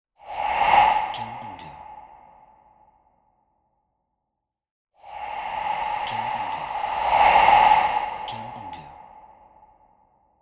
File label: Halloween Horror